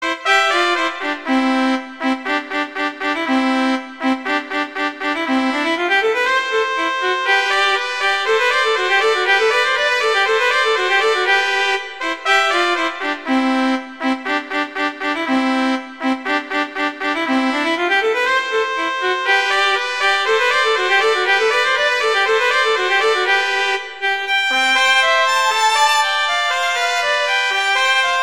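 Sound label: Duet, horn; Mozart; Duets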